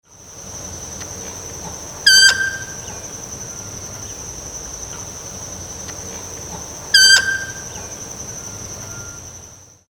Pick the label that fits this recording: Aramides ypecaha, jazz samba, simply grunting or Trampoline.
Aramides ypecaha